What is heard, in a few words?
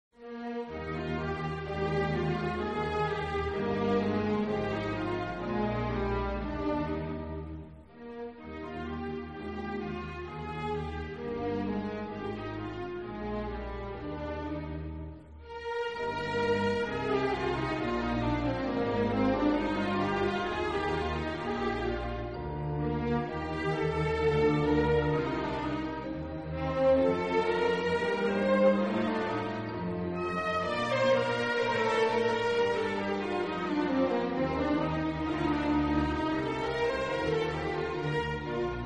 learning music